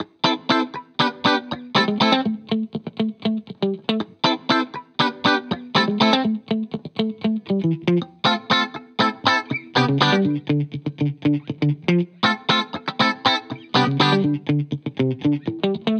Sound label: Guitar Bpm120 Key A minor Full, 70s Guitars Full Mixes; Fox Samples; Back to the 70s Guitars